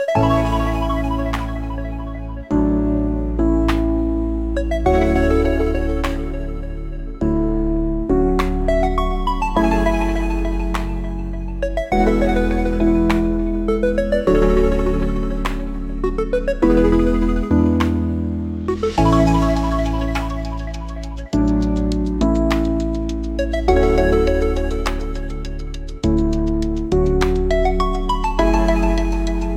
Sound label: tune, chiptune